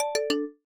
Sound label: delete timeline; TWBlue